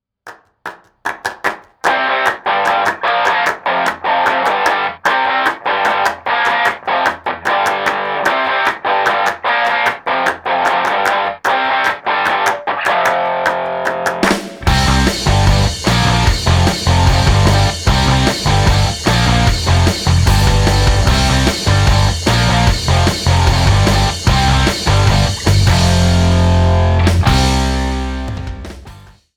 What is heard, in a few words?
Clap And Dance 150bpm